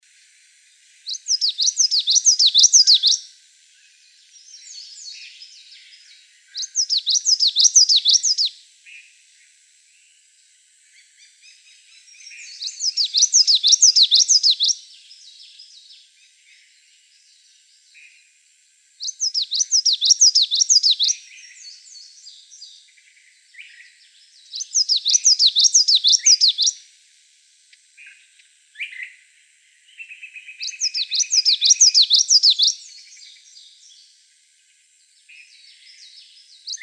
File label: common yellow throat song